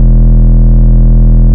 Root E, Bass

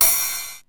RIDE, Classic drum machines; musicradar-synth-samples

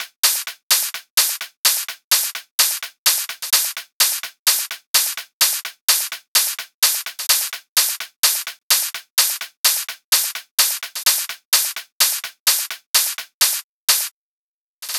Minimal Loops

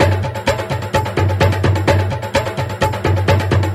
Latin House Loop 128 BPM; Vengeance Samples

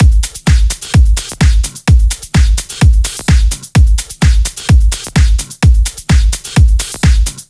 128BPM Kit; Vengeance Samples; Timebomb Kit

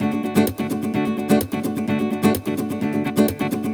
Nylon Guitar D maj, 128BPM; Vengeance Samples; VEH3 Nylon Guitar Kit 1

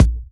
Bassdrums Breakbeat; Kicks; Vengeance Samples

